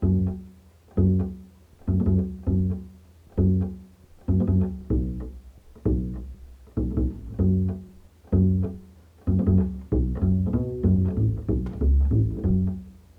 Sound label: bass rest EMD